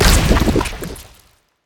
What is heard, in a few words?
explode; smite; ahpuch skin10